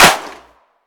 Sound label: large room snare single hit g key, acoustic snare tuned to the G note; snare drum samples